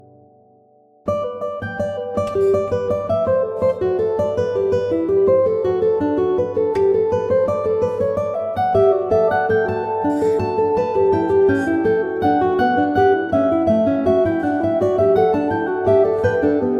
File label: guitar fughetta; BWV 902